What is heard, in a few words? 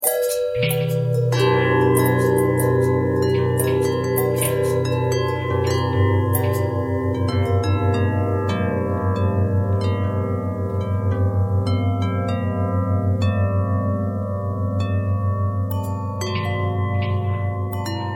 bell long bell harmonic twist